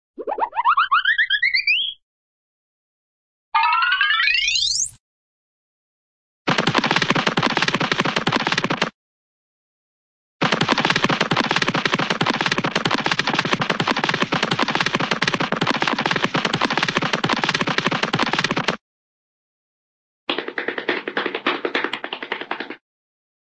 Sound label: run, cartoon wind whistle scat